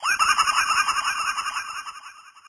laugh bitcrush; queen

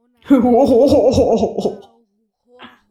hohoho variacao

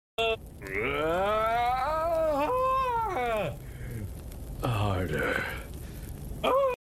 aaaaaaaaaaaaahhhhhhhhhhhhhhhhhh harder

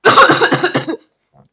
cough; pranksterfrankster

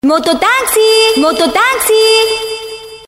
taxi moto

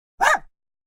single bark

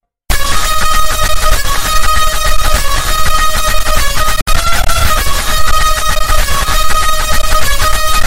indian earrape

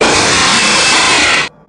jumpscare sound; fazbear shift 3

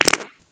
fart

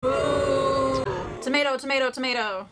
booo

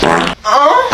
fart

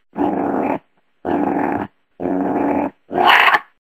cute bear growl

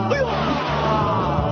uuyaaah

